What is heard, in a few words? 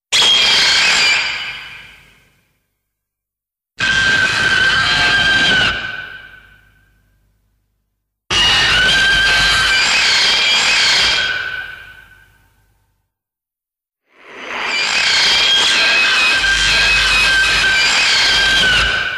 Roar; Ebirah 2004